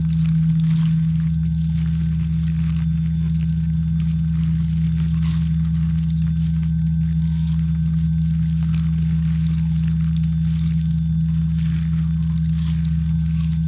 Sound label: ant ship science idle; biogenesis